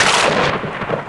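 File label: thunder; SoundFactory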